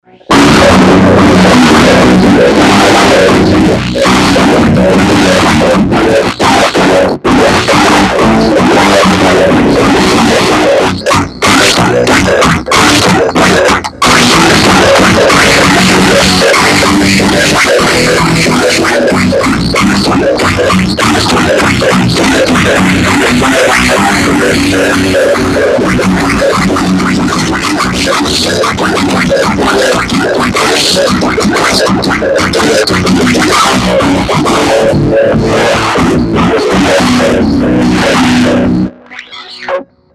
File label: Guitar; Plague Bearer